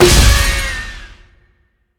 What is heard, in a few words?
Hit; Smite; god Kumbhakarna